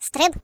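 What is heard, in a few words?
Jump, British; hedgewars; Default uk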